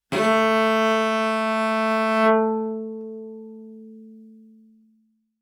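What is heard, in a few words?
Cello arco fortissimo sul A A3; MIS Pitches - 2014; Strings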